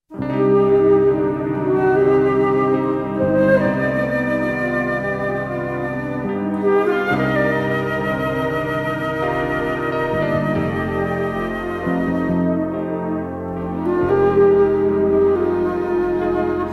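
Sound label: movie background music; urusei yatsura movie 1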